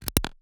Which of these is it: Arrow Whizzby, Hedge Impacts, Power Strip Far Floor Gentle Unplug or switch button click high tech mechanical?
switch button click high tech mechanical